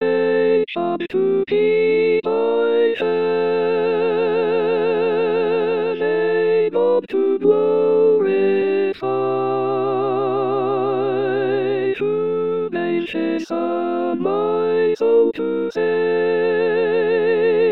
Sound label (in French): Hymne original A lent